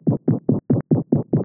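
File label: Cat HCM Dynamic subaortic stenosis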